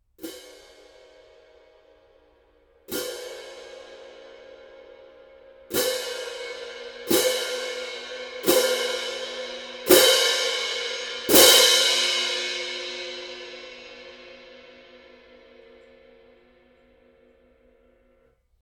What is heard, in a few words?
18in zildjian classic orchestral medium heavy